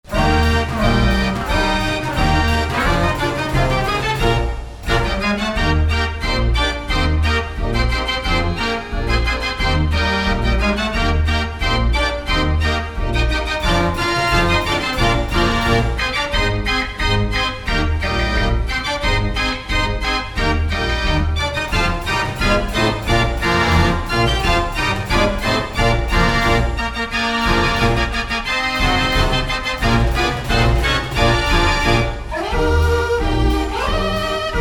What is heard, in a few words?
Melkboer Foxtrot, 101 toets mortier orgel; mortier orgel nr 1052